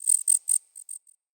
Wetland Forest Day Insect Close; Midrath Island; Ambience

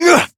Jump Adult Operator; Warframe; New War

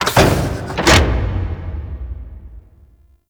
Space Port Large Door Close; Venus Open World; Objective Rooms

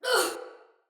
Pain, Female; Battle; Barks; Dialog; Sinecure Soldier Female B

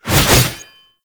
Void Heavy Scythe Charge Whoosh; Warframe; Duviri; Incarnon Adapters; Melee

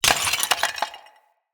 Vase Clay Small; Vases

